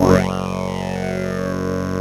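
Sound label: synth Flange talk; Best Service Gigapack 1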